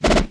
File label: raggler attack